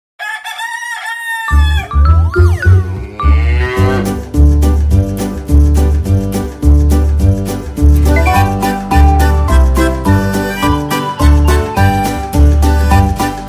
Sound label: Old Mcdonald Instrumental